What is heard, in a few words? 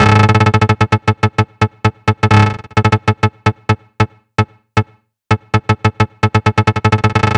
128BPM Tribal Kiss Melody; VFH1 128BPM Tribal Kiss Kit